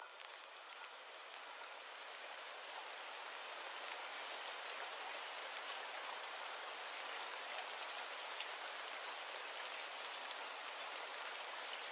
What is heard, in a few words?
Nature